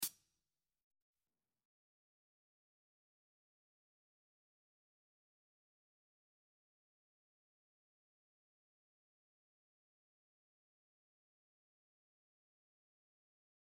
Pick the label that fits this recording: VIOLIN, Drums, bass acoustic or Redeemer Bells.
Drums